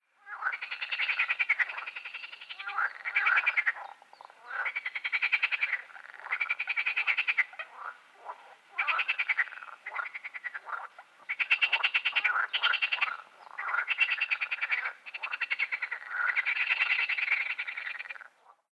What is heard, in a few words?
Pelophylax ridibundus; Rainer Guenther; tierstimmenarchiv